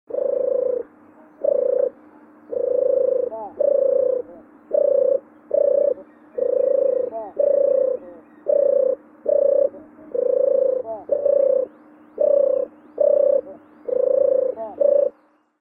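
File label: European turtle dove voice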